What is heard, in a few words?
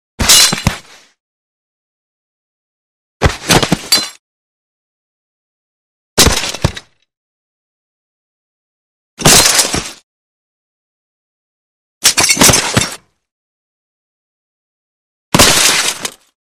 Body Falls With Heavy Glass Body Fall On Concrete; Hollywood Edge Foley Sound Library